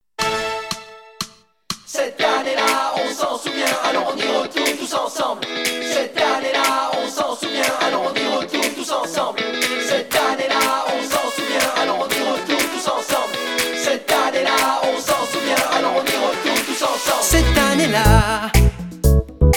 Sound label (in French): debut choeur chante